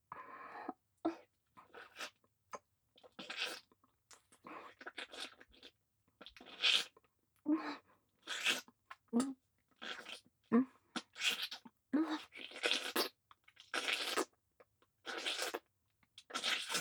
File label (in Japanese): フェラ